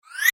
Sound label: multimedia max min interface sound whistle max; Multimedia Interface ' Maximise ' Sound ( Whistle Style Tone ) Ideal For Maximising Of Bro; Tones; Multimedia ’Maximize’ Sound; Sound Design; McKinneySound